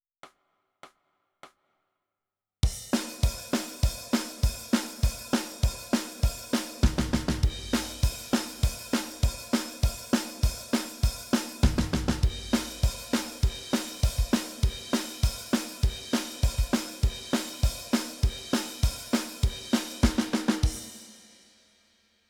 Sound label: Drums